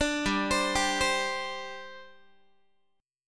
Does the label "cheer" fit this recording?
No